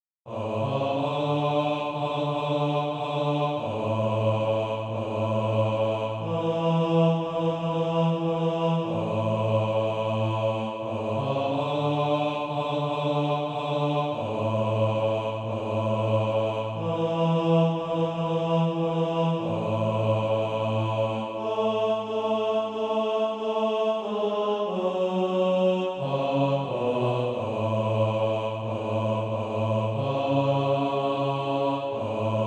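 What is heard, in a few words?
bass, Practise Music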